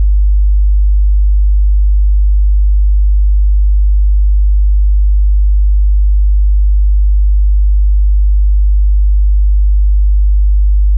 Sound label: 50hz -5dbfs